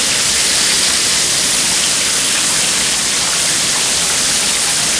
hydrant loop; tanks; War Thunder

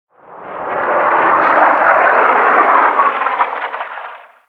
incoming; Explosions

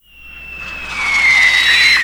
mortar incoming; Explosions

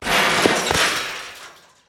objects, World of Tanks

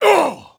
hurt heavy

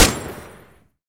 M60 x2 End; Weapons; DCS

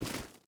footstep rubber boots gravel walk; Rust

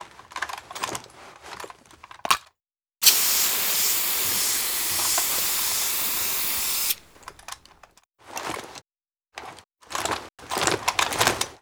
fence cut; icbm; Call of Duty Modern Warfare

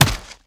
Inf Weapons Foley; Red Orchestra Ostfront 41-45

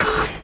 biomech run engine

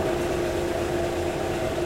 bomb hatch loop

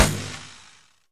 minigun fire end; Weapons; DCS

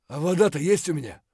dehydrated; Escape from Tarkov

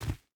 footstep burlap dirt jump land; Rust

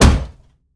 Aircrafts Engines Packard Merlin Prop; DCS

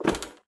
walk proflist; Escape from Tarkov

